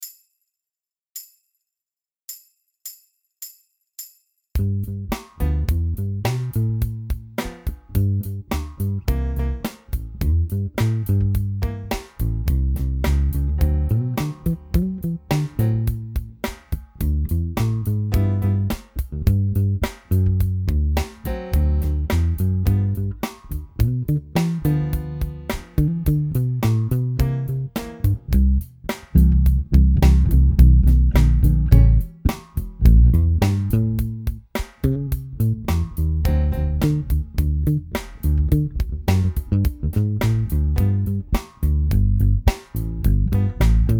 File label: R&B, bass